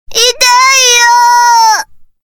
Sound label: die; sunnymoon